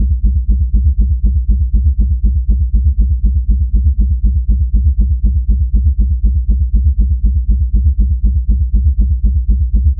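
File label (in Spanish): vibración de baja frecuencia; pulse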